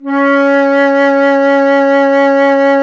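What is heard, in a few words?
FLUTE C#0; Roland LA; ORCHESTRA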